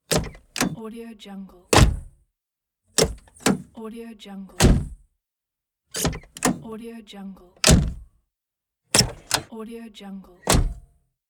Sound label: car door open close